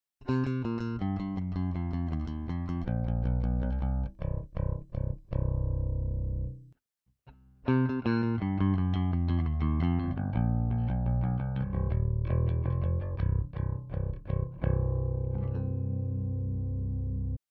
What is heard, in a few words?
speakersim; DI